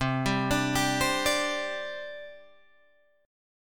C Suspended 2nd C Guitar Open G; down; chord shape

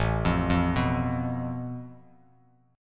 A Suspended 2nd Sharp 5th, Bass Standard; arpeggiated; JGuitar

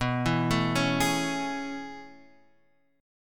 A Major 7th B; arpeggiated; JGuitar

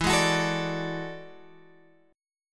E 11th; E11 Chord {12 11 X 11 10 10} strummed

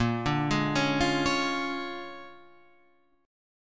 Bb 7th Sharp 9th Bb down Guitar Open F; Bb7#9; arpeggiated; JGuitar

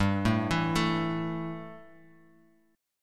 A 5th G, guitar chord; A5/G; arpeggiated

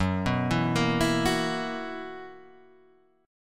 B Major 7th Suspended 4th Gb

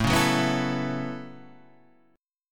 A 6th Add 9th A down Guitar Half Step Down

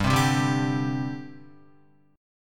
G Suspended 4th, Guitar, Gsus4 chord; strummed; JGuitar

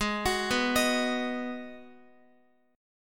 Ab Minor 7th down Guitar; arpeggiated; JGuitar

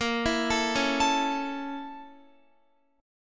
A sharp Minor Major 7th Flat 5th A sharp Guitar; A#mM7b5 arpeggiated

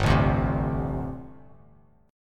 G Suspended 2nd Suspended 4th, Bass Standard; strummed; 3,0,0,5

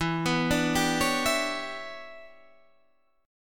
E Minor 13th guitar chord, Half Step Down

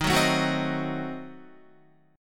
D sharp Diminished 7th, Guitar; strummed; chordshape; 10,9,9,11,12,11; JGuitar

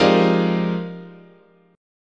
F Minor 13th, chord

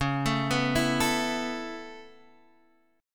C sharp Minor 7th Sharp 5th, Guitar Standard; arpeggiated; chord; x,4,7,4,5,5; JGuitar

